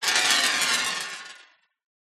industrial ball bearing metal roll glass surface

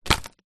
handfull of sticks drop hard ground; foley

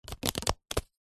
foley card pile of beer mats stack

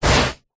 foley wood pole end short scrape drag on carpet; zapsplat

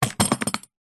industrial tool box plastic metal latch open

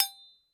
impact metal small; sound effects one

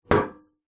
household stool chair metal set down wood deck

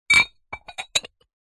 household ceramic bowls square x2 stack

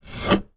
industrial tools stanley knife score cut wood; sound effects one

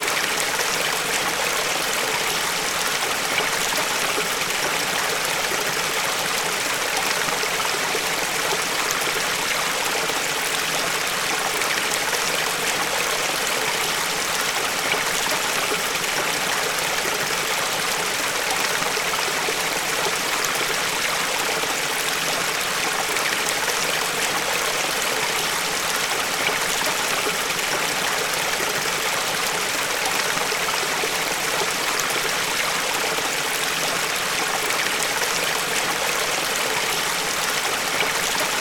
water stream waterfall small mountain; sound effects one

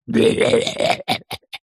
cartoon monster gremlin troll crazy laugh

